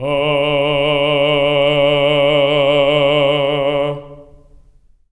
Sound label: TENOR D3, Voices; Spectrasonics; Symphony of Voices